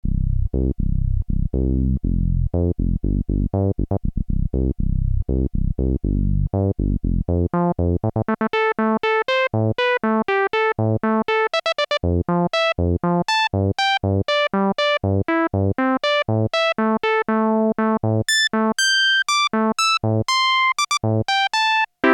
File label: yamaha tg77 filter lpf+lpf ser q050